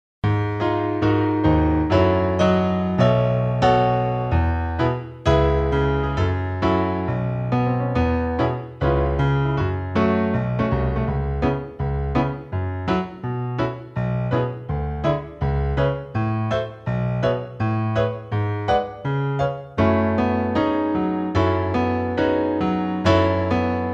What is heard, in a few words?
BARITONES accompaniment